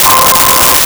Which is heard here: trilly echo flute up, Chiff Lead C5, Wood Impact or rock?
Chiff Lead C5